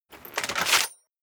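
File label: dagger one hand draw, equip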